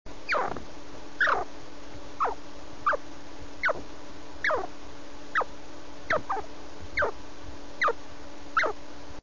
chinchilla baby

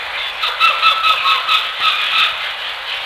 western gray, squirrel

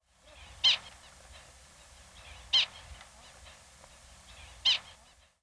prairie dog

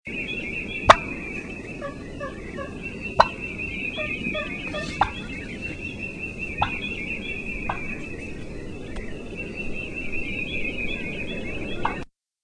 Turkey Cluck

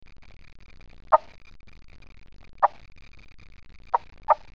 Turkey Cluck

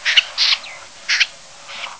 snipe; upland birds

